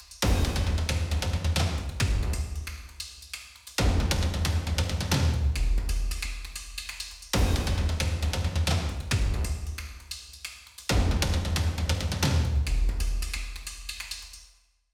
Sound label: REVERB PERCUSSION REVERB ON